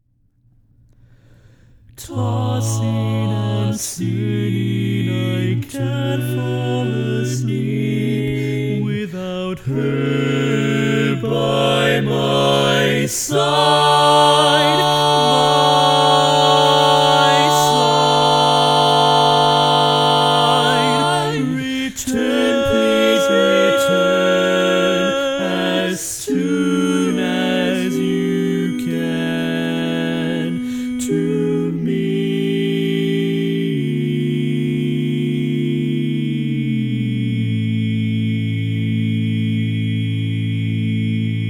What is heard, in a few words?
All Parts, barbershop